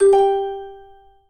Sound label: collect freeround end; slot game; Fire Queen